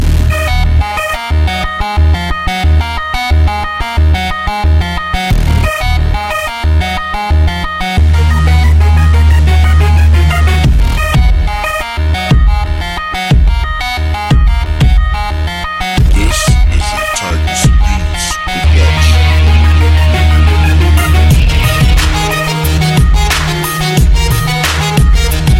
gangsta beat; hip hop

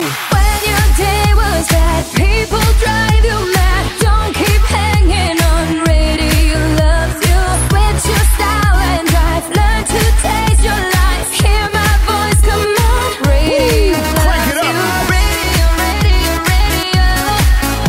Love Dance Radio

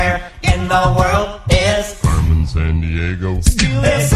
Is Calling Jazz

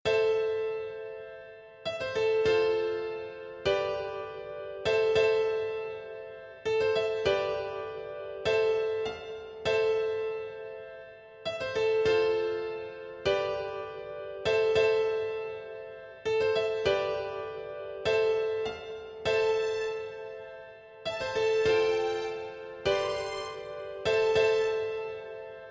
pianino

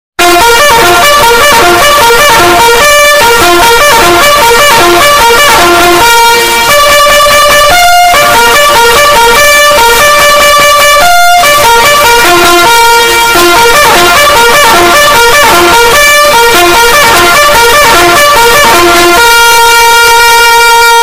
alarm

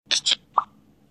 Twitter Pop

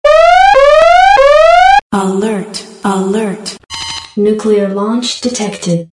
Virus alert

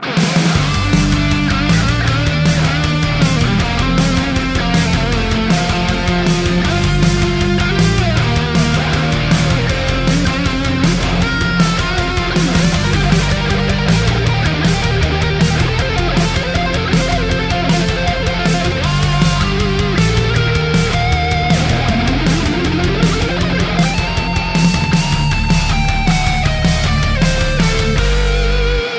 guitar solo

